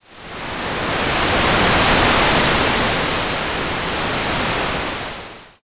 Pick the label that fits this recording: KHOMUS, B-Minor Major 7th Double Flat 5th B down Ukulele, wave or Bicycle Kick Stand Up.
wave